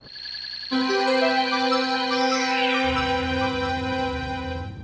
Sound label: star appears